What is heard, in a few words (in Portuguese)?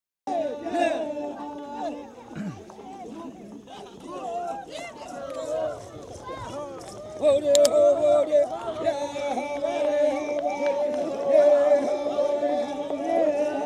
timbiras